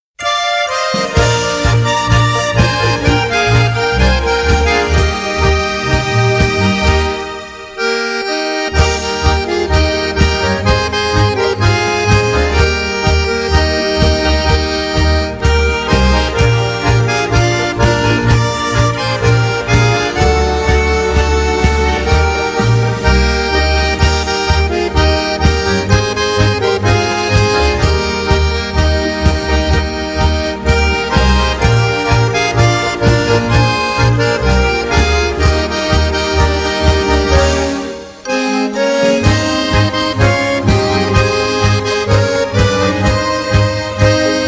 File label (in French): tango; dézaley